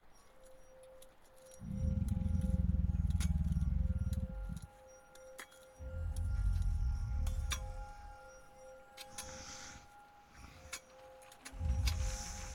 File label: AMB Cristallo gargoiles; BLIND QUEST - The Enchanted Castle